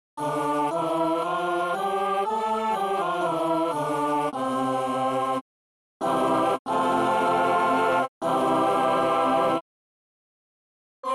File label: Mellotron